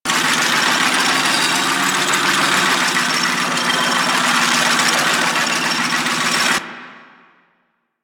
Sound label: Zap Trap Rolling Loop; Warframe; Trapper